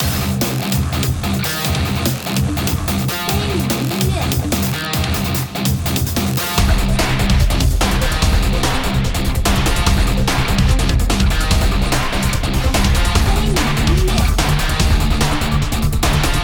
电子-摇滚-战斗

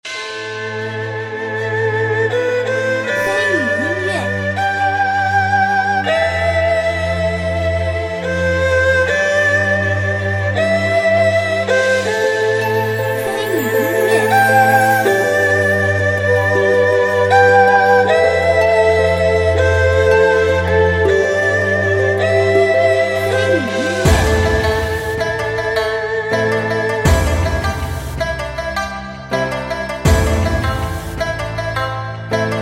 忧伤 希望 古风

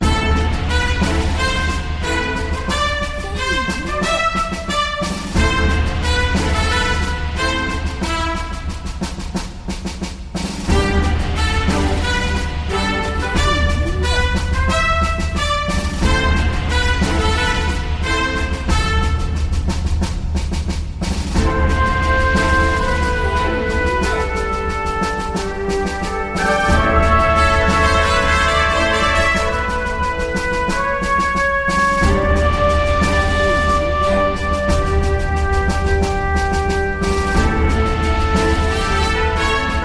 军团战场景

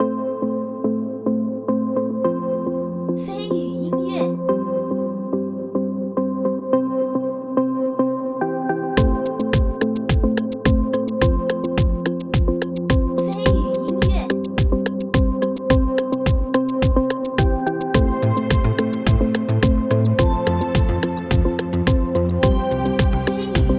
节奏-电子

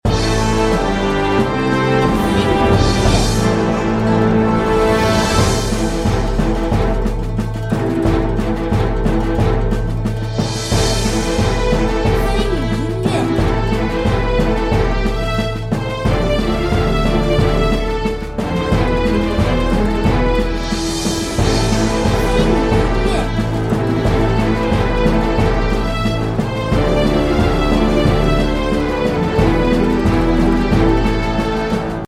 严肃 磅礴 恢宏

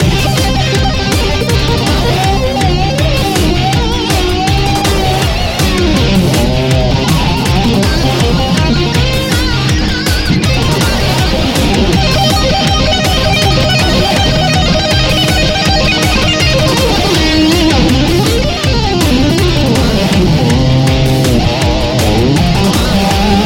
boss战斗背景音乐

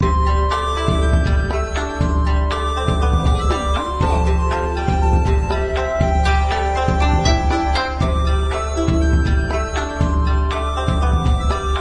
轻盈-欢快-春天

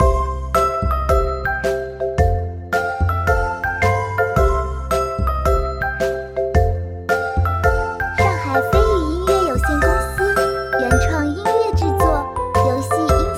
Q版可爱明亮

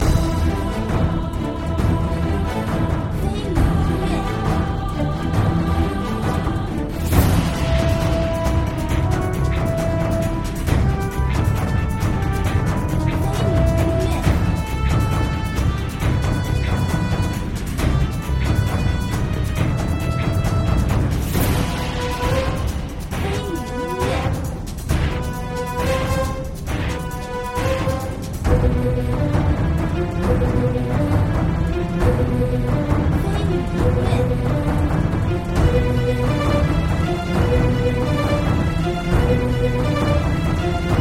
战斗 魔法 幽幻

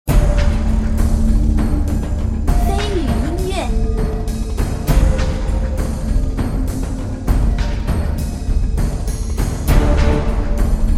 沉重-悬疑-诡异

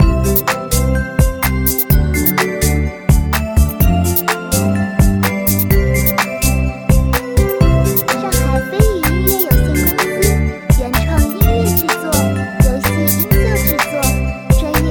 Q版明亮; 美食都市

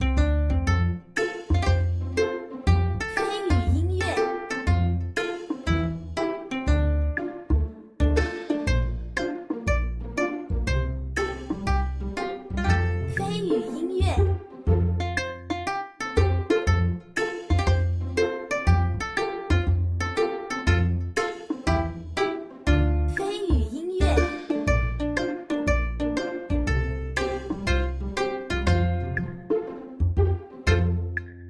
休闲-轻快-俏皮

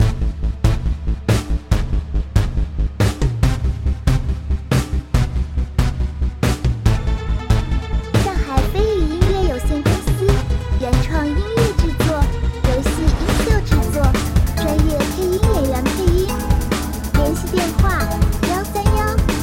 电子Q版紧张战斗; 超连英雄

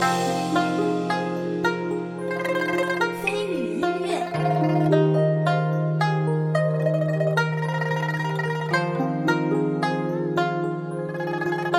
感动-励志-梦幻

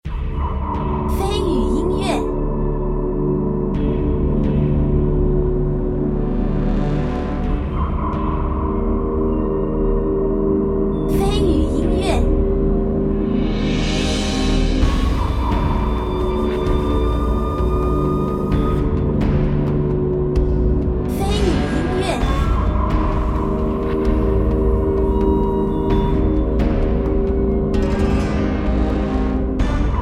副本 紧张 紧迫

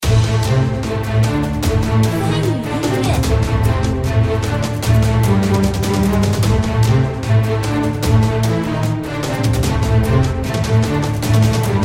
战斗背景

